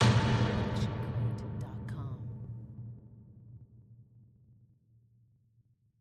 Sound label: designer helicopter hit; SoundsCrate